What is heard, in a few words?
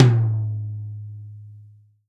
TOM, Real Drum Kit, Rock Kit; Best Service ProSamples vol.43 - Real Drum Kits